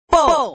bowl

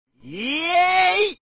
yay; reverse

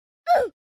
oof

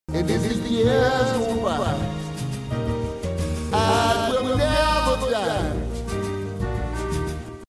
die

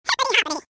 hiphop

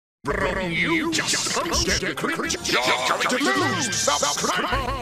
cringe; cave

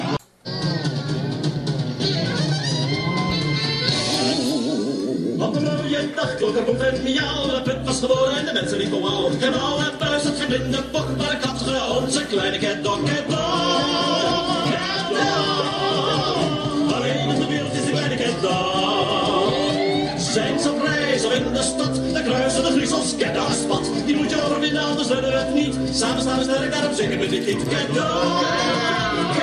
chant

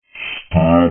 step; vapesquared

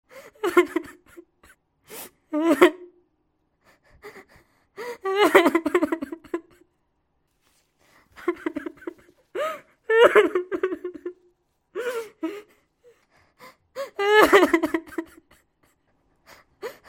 cry

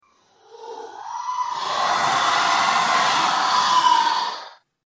augh, reverse

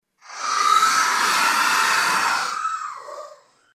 augh

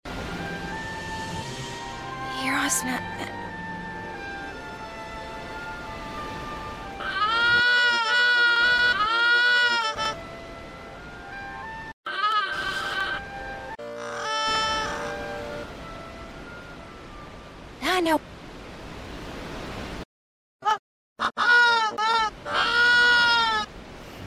aaaah; reverse

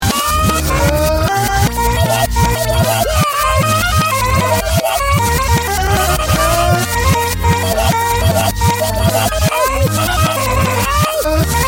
waoah; reverse

